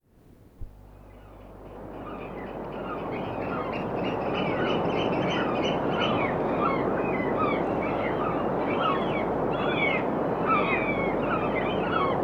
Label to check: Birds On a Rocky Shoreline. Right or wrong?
Right